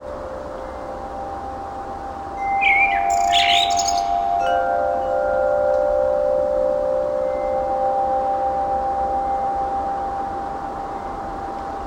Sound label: chimes